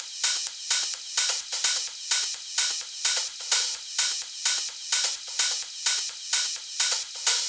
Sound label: 128BPM Classic Kit; VFH1 128BPM The Classic Kit